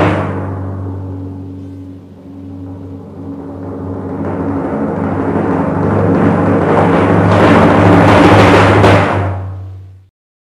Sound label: sputtering, Tympany; Misc Percussion